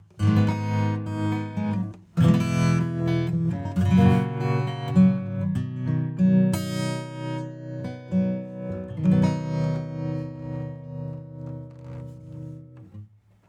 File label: Speed 2.15Hz LFO Smooth; VolumeShaper 6